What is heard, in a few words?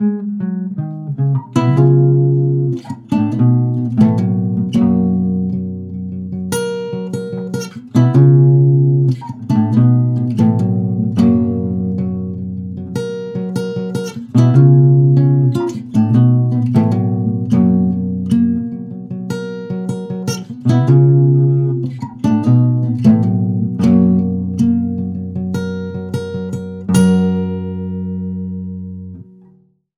Outro Acoustic; The Escort Mission Podcast OST